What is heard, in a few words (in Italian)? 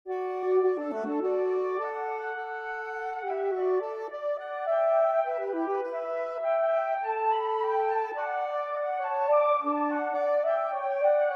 Duetto Per Flauto E Saxofono Soprano MIDI